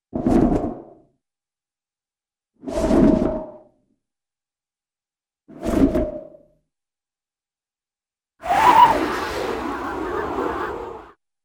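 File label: Swish with flapping Sound; Cartoon; Hollywood Edge; Cartoon Trax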